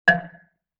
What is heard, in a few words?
Xylophone Chord F; Vintage Cartoon; Musical Elements